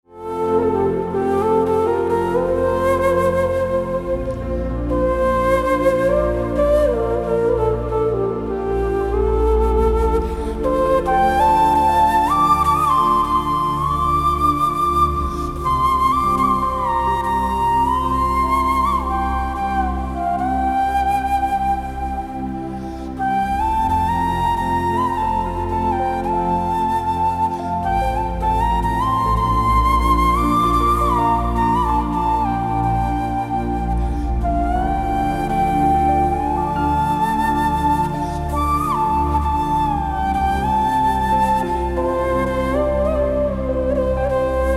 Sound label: Bansuri